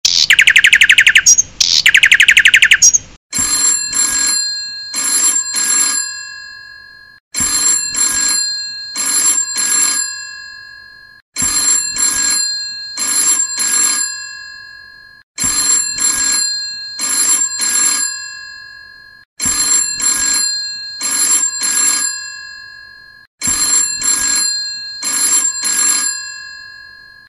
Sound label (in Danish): ur alarm klassisk stil, ringetone